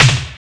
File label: SP12 SNARE; SP-12+1200; Greytsounds VOL-1